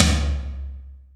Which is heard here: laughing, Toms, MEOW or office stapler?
Toms